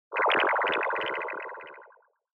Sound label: Negative High Long; Onoma; オノマトペ ネガティブ; 高 長